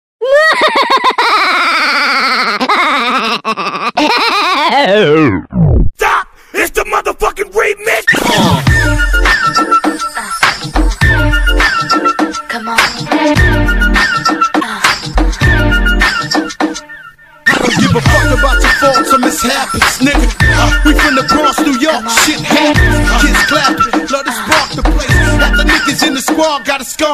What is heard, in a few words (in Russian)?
pozitivnyj rington